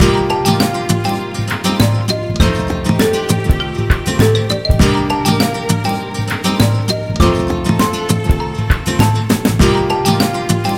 Waiting Room; FantasyTennis

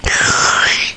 slime drop; Pamela Anderson Interactive

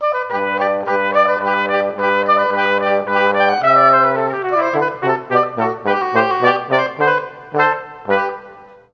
Trp Ob Tbn; Juilliard Music Adventure

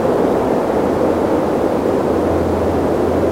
Wind Storm; 1944 Battle Of The Bulge

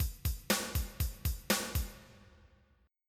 rock, drum

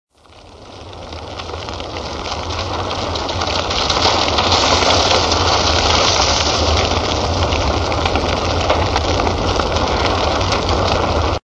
Fire Starts Up; Survivor The Interactive Game

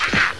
ahh; The SoundBlaster Multi Media Experience vol 4

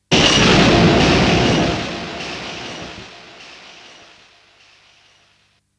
exhale

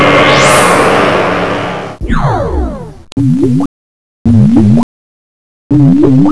atmos bub; XMEN2

